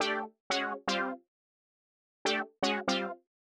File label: 120 Stabs D, Keys, Nu Jazz House; PowerFX